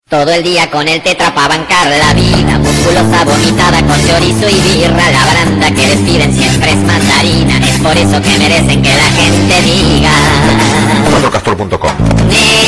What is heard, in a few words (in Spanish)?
boton musical